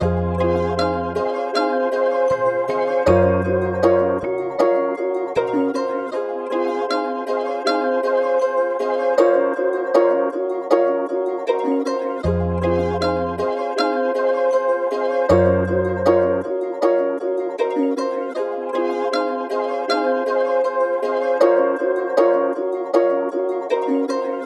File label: 157 BPM F Min, Melodies; Angel Wings; Cymatics; Immortal Production Suite Beta